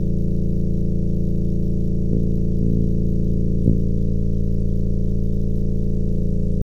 Panel Electrical Distributor Spin; Among Us

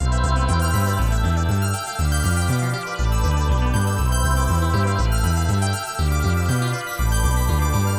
Long Hallway - Electro

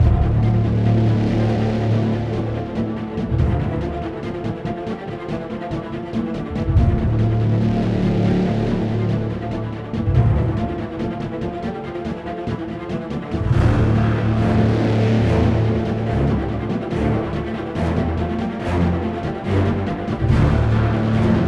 Mission Military; the dark knight rises android ios 2012

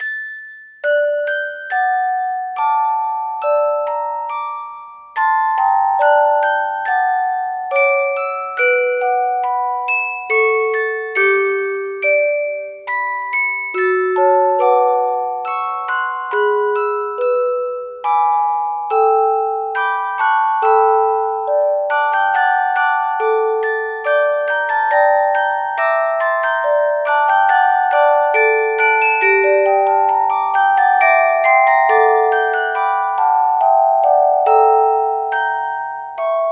Music Box; another code r a journey into lost memories wii

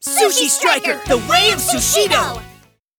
Menu Jingle; sushi striker the way of sushido

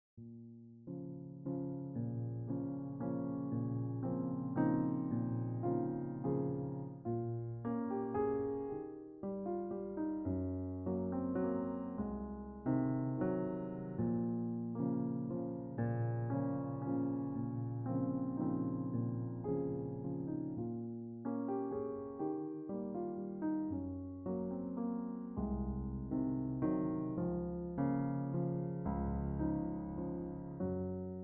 Birthday Piano Theme; Cube Escape Birthday

